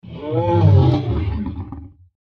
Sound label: deathclaw pain; npc